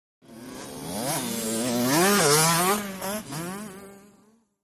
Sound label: Motorcycle Motocross; By 50 Cc, Cu Fast By L / R, Shot Cu.